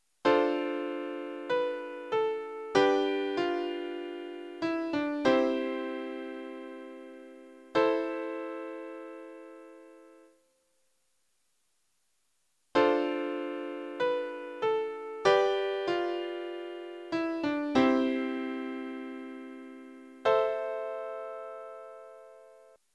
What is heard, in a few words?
Swan Lake